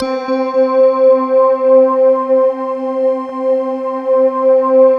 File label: bell choir, slow bell choir; Optical Media International - Sonic Images Library